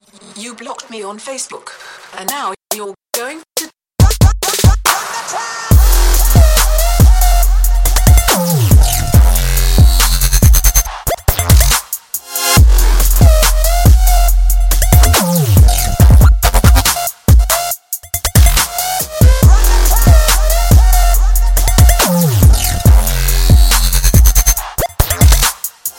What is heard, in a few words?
trap